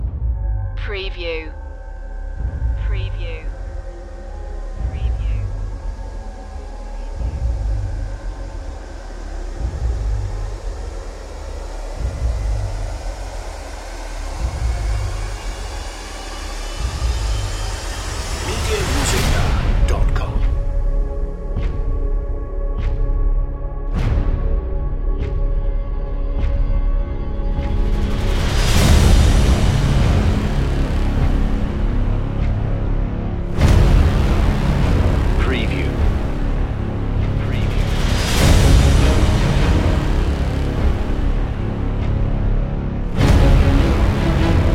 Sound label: battlefield no choir